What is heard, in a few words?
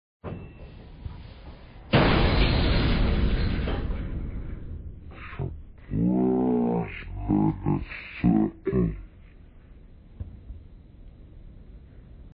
surprise